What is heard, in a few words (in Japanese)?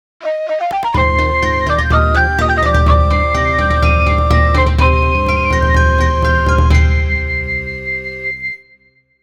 ジングル; 勇儀